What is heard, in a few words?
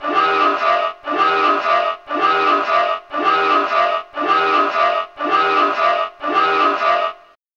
Warning